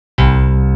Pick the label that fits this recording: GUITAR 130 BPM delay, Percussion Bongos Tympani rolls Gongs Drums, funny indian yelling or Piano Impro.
GUITAR 130 BPM delay